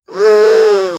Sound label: bear attacking; danger